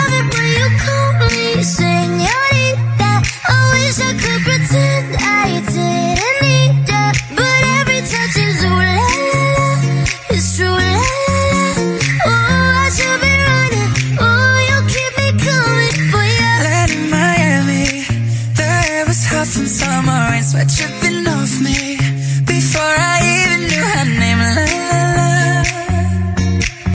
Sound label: beautiful english song ringtone